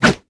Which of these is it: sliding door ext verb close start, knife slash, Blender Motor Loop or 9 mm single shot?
knife slash